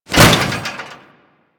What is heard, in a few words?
Restrained Foley; Warframe; Solaris; Solaris Worker